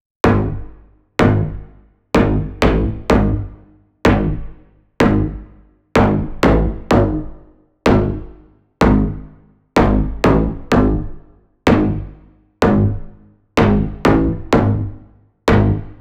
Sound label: Synth Instrument Loop 126bpm G bass; BRAZBASS; Zenhiser